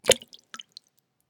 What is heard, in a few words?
shell 12ga water; STALKER; IWP